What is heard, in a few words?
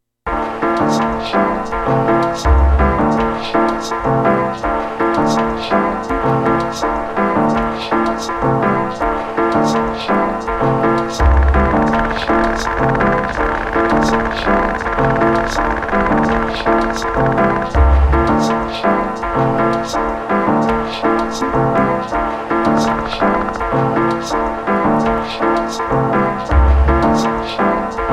amb 109 bpm